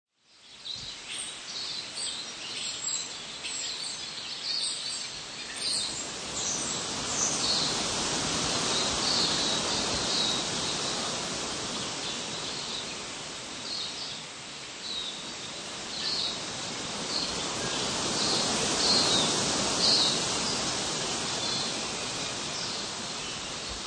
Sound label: Plane, Expansive ourdoor plane. Sparrows in the trees, wind r; Southland Plane: Expansive ourdoor plane. Sparrows in the trees, wind rustling through the trees and tussock grass on a southland; Environmental Sound Effects